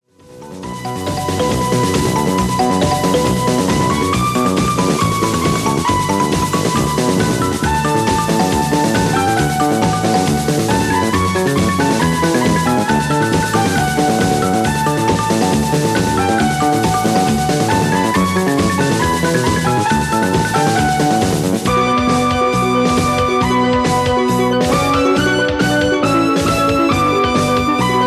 magic music

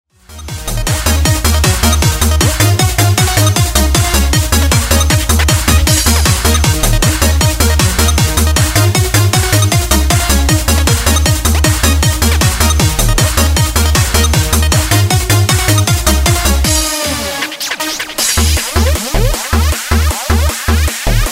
hit house

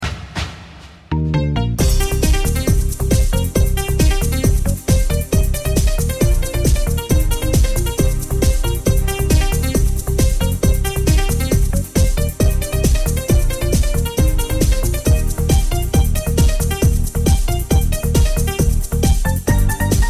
piano

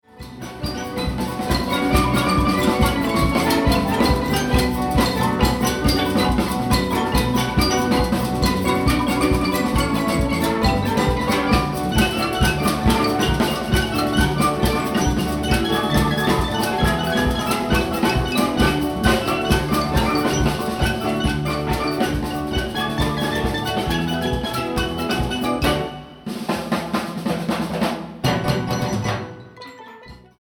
marimba

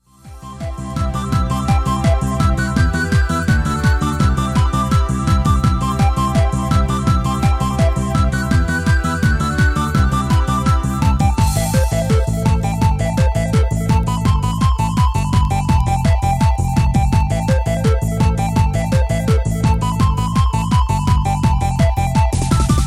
popcorn

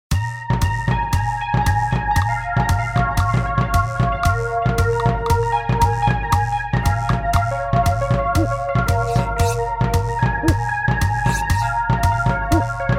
bongo

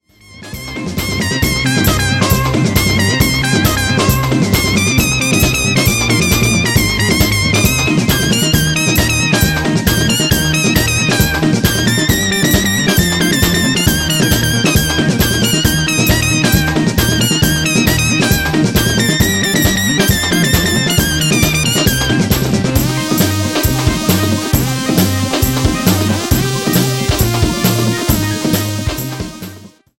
blip spaced

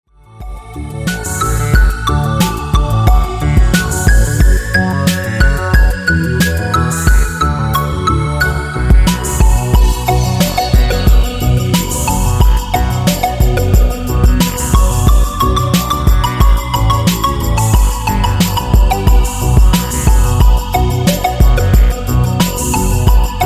juno80 electro

